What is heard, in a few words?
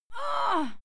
female fight moan pain; schreie; menschen